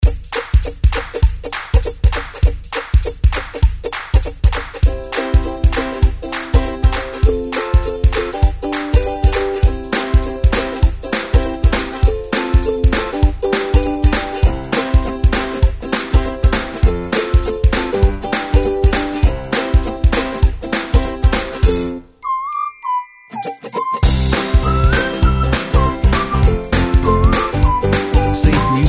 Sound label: Upbeat Ukulele